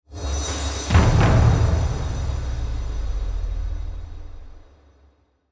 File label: mood intro triumph; spirit blossom